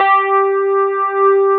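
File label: Wurlitzer G4, Keyboards Old School; USB Soundscan vol.09; AKAI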